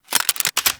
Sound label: Reload, Weapons; Warframe; Cedo Prime